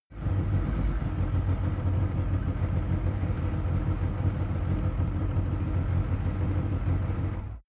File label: car lancia s4 idle